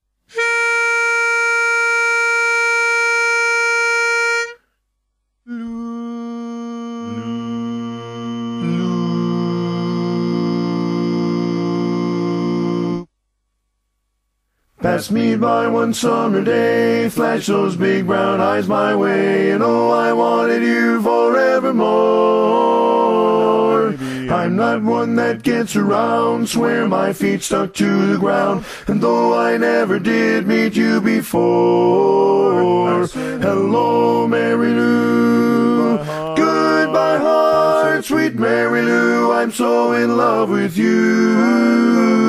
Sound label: Hello Bari